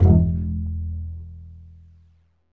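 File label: STRINGS, Cbs Pizz; String Master Series; Cbs FX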